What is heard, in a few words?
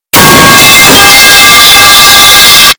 metal pipe falling boost